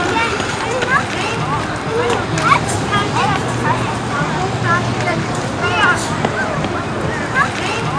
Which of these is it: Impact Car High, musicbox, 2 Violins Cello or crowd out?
crowd out